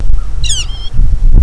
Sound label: Red-shafted Flicker female; Northern Flicker